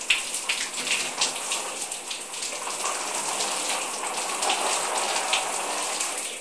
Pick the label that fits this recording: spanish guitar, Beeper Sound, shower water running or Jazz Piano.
shower water running